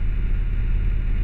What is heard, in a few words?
airport rumble; Soldier Of Fortune II Double Helix; XBOX; ambience